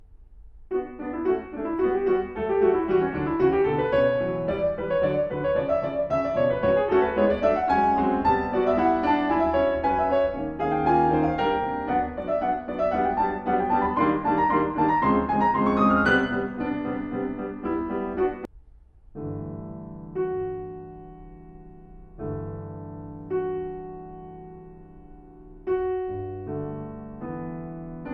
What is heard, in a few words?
Piano Sonata Excerpts; Silberstein